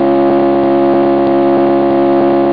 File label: engin